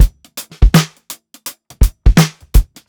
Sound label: SWUNG 83 BEAT, DRUMS LOOPS; BASEMENT DRUMS VOL 2